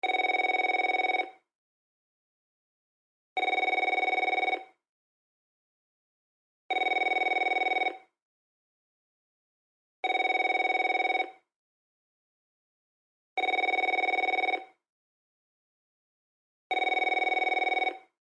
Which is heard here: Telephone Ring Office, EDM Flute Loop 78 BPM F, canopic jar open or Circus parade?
Telephone Ring Office